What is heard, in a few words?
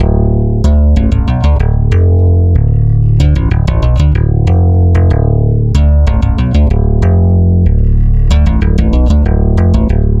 Stick Loop, Stick Bass